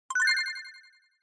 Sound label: Short Accent Delay